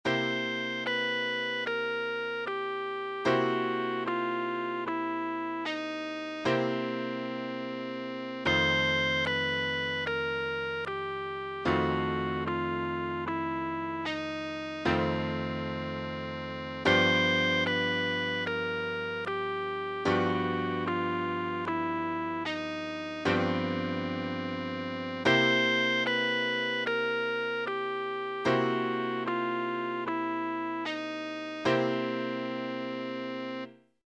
blues scale

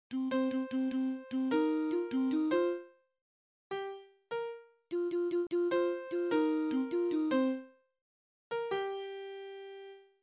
melodic riff

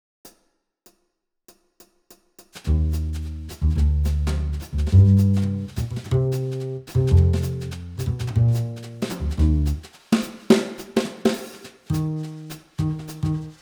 minus piano

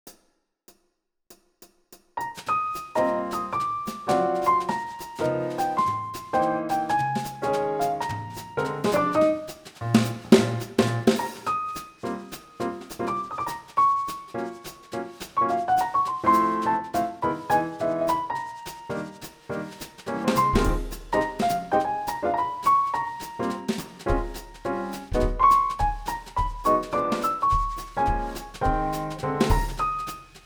minus bass

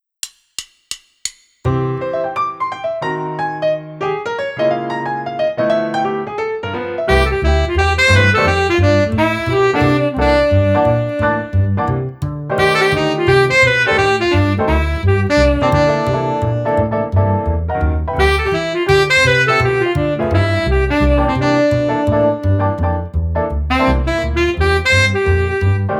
minus drums

